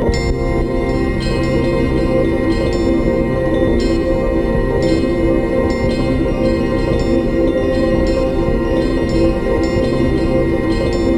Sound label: ATM SYNTH; Best Service - XXL Production Effects